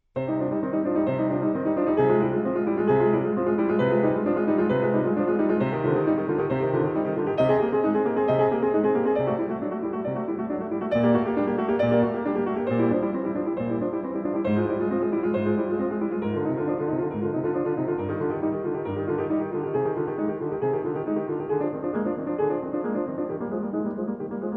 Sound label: piano